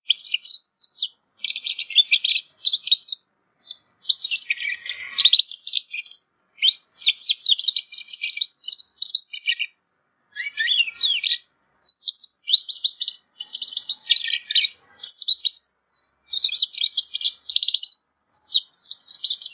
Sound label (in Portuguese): Filhote de Canario Belga Aprendendo a Cantar